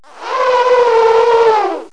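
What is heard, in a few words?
elephant; animais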